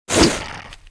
attack

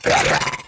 war damage; FLEMGUARD

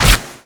Mace cloth, Damage

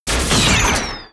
WAR DAMAGE; Mob

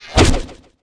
High elf Ranger Attack

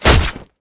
Sword cloth, Damage

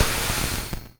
damage; ACCRETIA

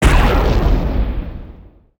Launcher Flash Cast; bullet

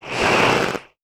Elder war idle

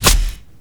Mace Scale, Damage

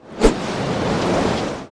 Aqua Blade

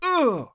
damage; Psyper; Mob

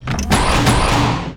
catapult combat attack

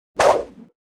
knife thow, Weapon

